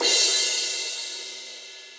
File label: Multirod Crash 17 Dry Acoustic, Cymbal; Drum Hits; Standard Hits; Session Drums Studio